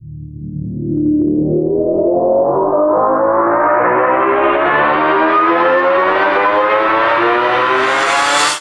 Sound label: Uplifter